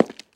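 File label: close ringing single step of heels on parquet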